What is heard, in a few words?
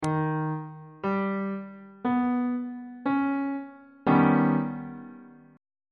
d sharp augmented 7th chord on bass clef